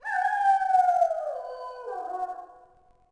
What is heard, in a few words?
animal; DAoC; Toa